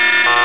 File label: Alarm Loop; PC Gamer IT CD 48; Big bang